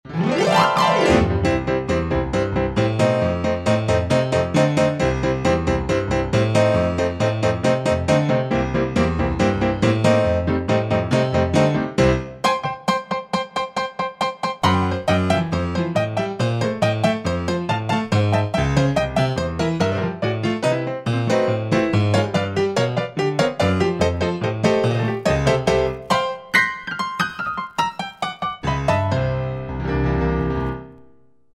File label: Rock Acoustic, Roland SRX-03 Studio SRX